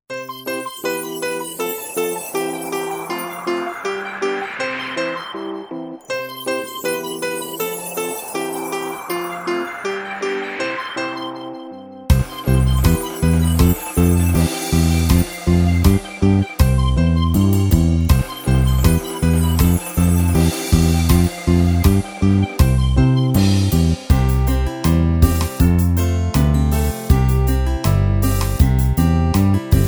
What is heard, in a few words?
Karaoke